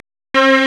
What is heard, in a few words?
STRNG; CMP - Analogon